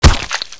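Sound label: machete hit body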